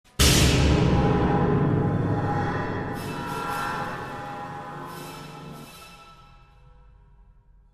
SHOCK; F.E.A.R. Perseus Mandate

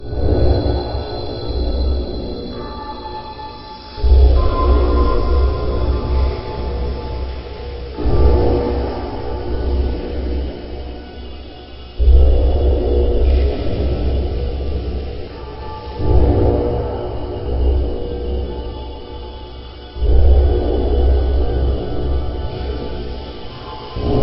Level4 Background; Pixel Gun 3D

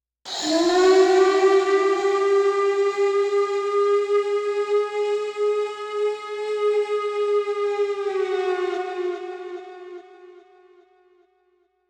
Boss Fight Theme Loop; Frozen Flame; windows; FF Boss Fight Theme 1 Loop P2 Gorn

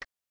Spin; Wheel of Fortune Deluxe Edition 1993 snes

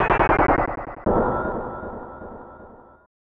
Game Over; Super Tetris 3; Sparkliss

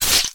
Slice; boomerang fu

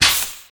Panel Plug In; Among Us

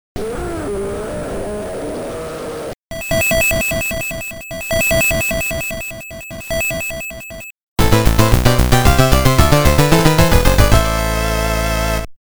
Block Clear (Pattern Clear)

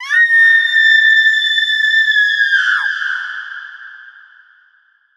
Scream

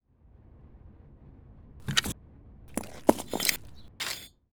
Wedge Slab; yesterday origins gamerip 2016 switch ps4 pc ios macos

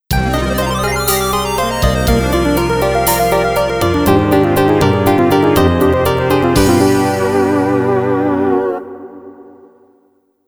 Game Over (MIDI Sound Source CM-64 Version)

